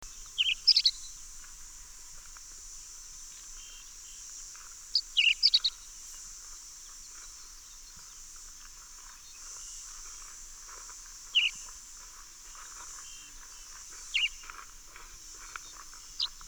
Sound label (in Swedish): Nunnestenskvatta